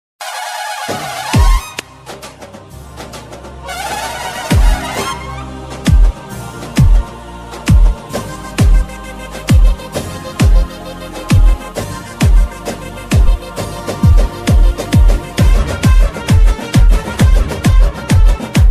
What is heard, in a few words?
mass bgm, tamil; sandakozhi